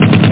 machinegun

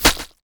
arrow flesh; Gamestar

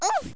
oooff

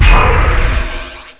T PORT; CHASM

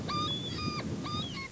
SEAGULL

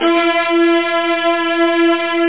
strings

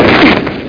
crrunch; music samples

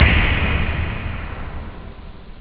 drums snare; OctaMED SoundStudio V1

